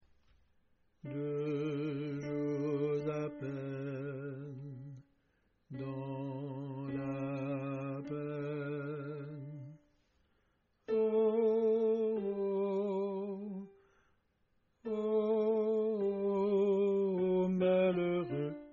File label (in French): alto